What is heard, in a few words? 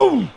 grunt; Superbike 2001